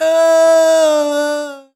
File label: "oh" sung